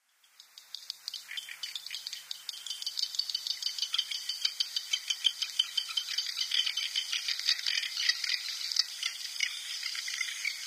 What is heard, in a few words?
Magnificent Frigatebird